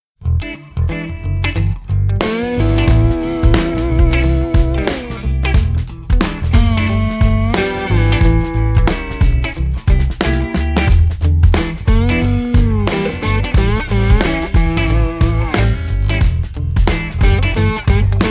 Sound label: Funky Hangover Blues